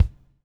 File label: BUBBLE; Spectrasonics - Backbeat